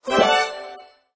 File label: win; dunk hoop3